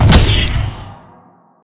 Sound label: damage; sprunki simon pack